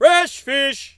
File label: Market, Ambient; PC Gamer IT CD 46